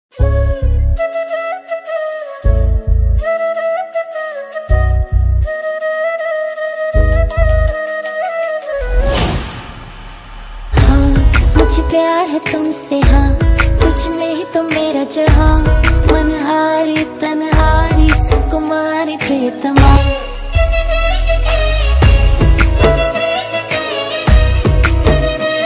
hindi ringtone

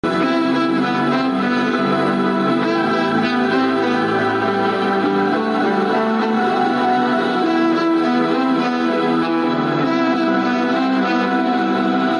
ringtone love violin music; bubblegum movie

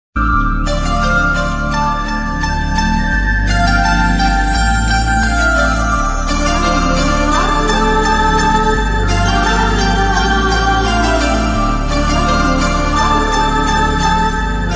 tamil bgm ringtones south indian bgm ringtones; Bharani movie

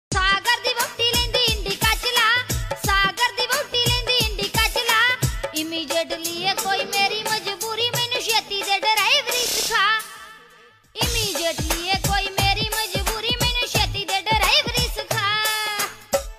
panjabi ringtone

